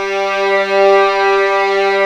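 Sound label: G3 legato forte, violins; East West; The Ultimate String Collection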